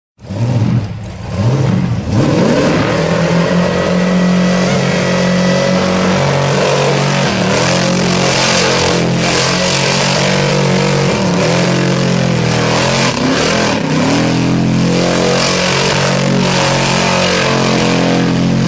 supercharged burnout; ringtones

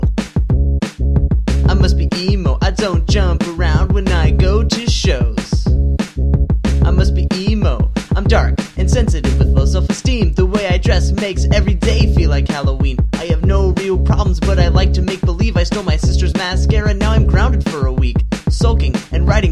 the emo song, ringtones

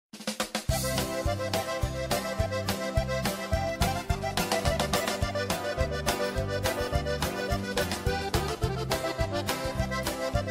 Music; Warthog

